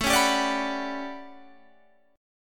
A 13th, Guitar Standard, chord; strummed

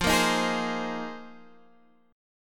C Minor 7th Sharp 5th, F, guitar chord; Cm7#5/F strummed; JGuitar

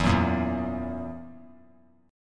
E Suspended 2nd Flat 5th E down; strummed; JGuitar

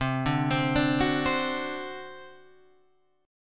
C Suspended 2nd Flat 5th C down Guitar Open G

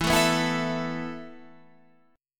E Suspended 4th, Guitar, Standard; Esus4 strummed; 12,12,9,9,10,12

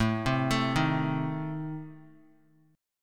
A Diminished, down Guitar; Adim arpeggiated

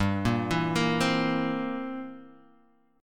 C 7th G

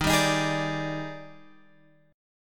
D sharp Major 11th, guitar chord

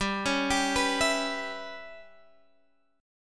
G 6th Flat 5th, Guitar, Half Step Down; G6b5

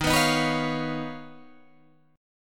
D sharp 7th Suspended 2nd Sharp 5th Guitar Drop D

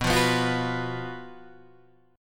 F sharp Major 7th Suspended 4th, B, chord

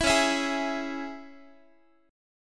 Db Minor Db Guitar; strummed; JGuitar

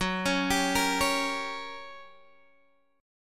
G Minor Major 7th Flat 5th, F sharp

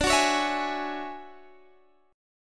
D Major Sharp 11th D Guitar DADGAD, chord

